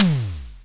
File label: Simmons SD-5, drum machines